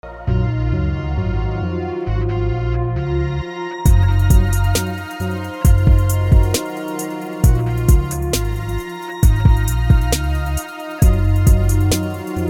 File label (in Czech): melodii beat; k vasemu zpevu